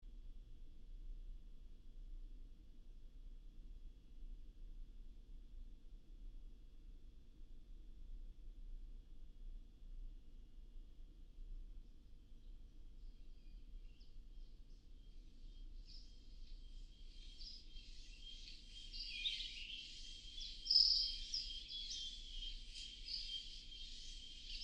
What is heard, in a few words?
Soundscape Binaural; SoundscapeNo1